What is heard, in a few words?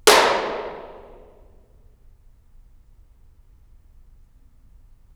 clap carpet